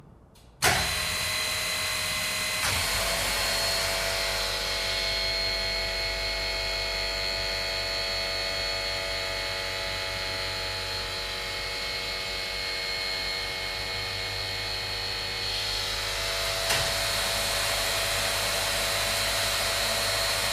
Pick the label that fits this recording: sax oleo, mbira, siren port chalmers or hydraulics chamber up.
hydraulics chamber up